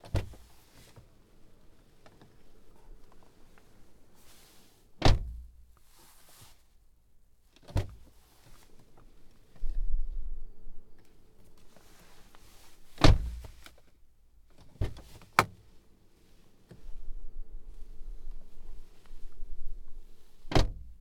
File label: huyndai getz opening from inside; sonniss gdc